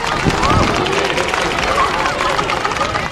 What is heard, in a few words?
APPLAUSE AND CHEERING; people